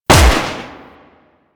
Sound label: Lever Action Pistol Shot; Grineer